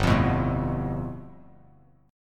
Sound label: G 9th, Bass Standard; strummed